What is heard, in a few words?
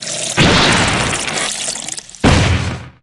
tea overflow; garrideb